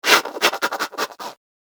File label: Fast Friction Rubbing; Miscellaneous Cartoon Sound Effects